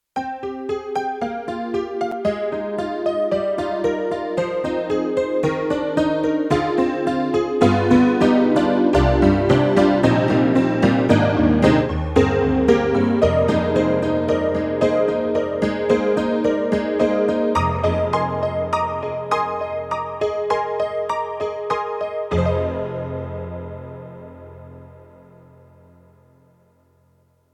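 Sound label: YAMAHA MU1000EX, Honky Tonk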